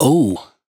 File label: "Ooo", adlib, Dry Vocals; Raw Vocals; Slate Digital Omnivox Vocal Sample Pack; Adlibs